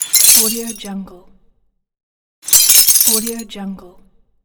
glass drop

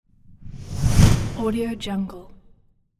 woosh

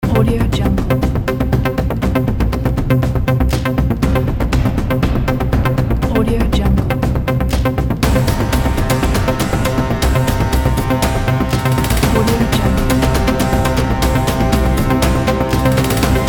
epic logo

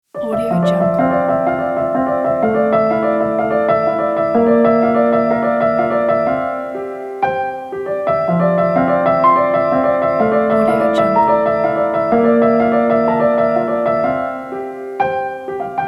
piano; alexguz

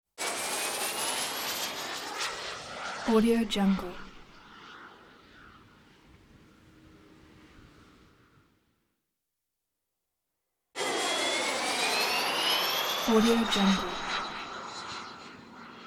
pod blast away whoosh sizzle whine; prosoundfx

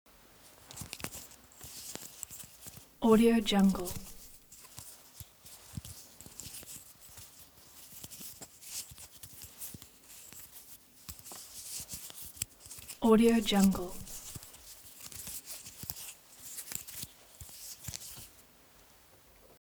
object makeup box stroke handling

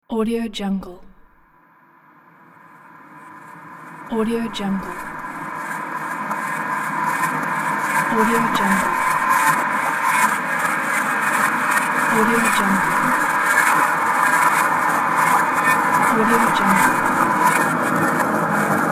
soundscape scifi machinery

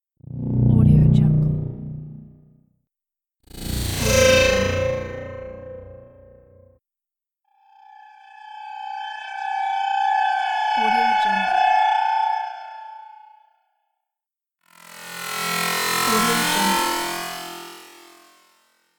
scanning scifi

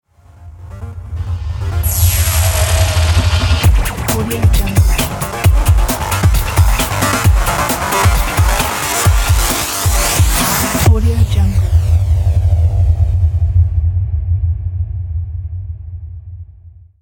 electric logo

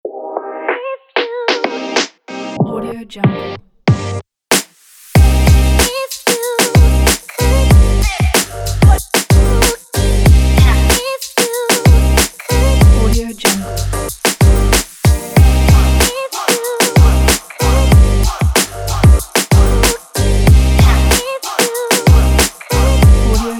hiphop

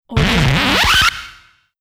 vinyl record scratch; mvpsfx

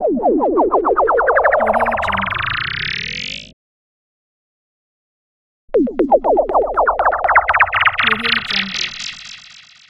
spaceship

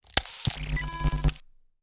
energy handheld generators; soundmorph

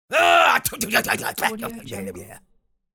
cartoon silly monster vocalizations; applehillstudios

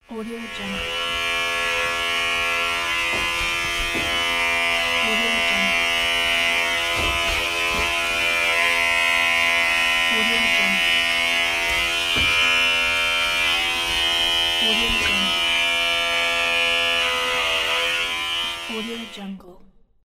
hair clipper machine

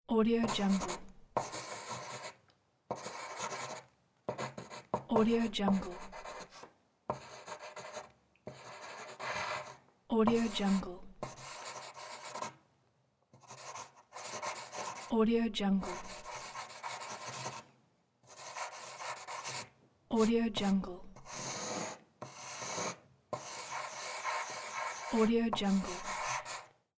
pencil writing on paper; soonus

